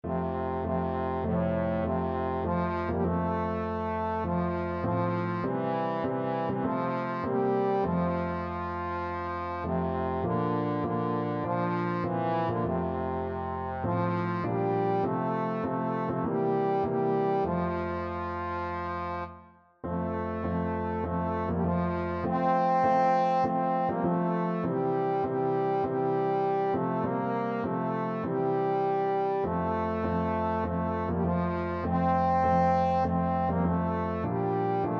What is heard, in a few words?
trombone